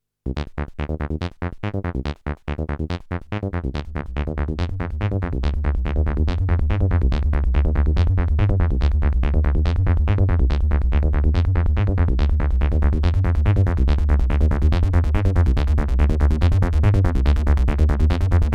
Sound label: Moog Sound Studio Patch Chase Mother 32 DFAM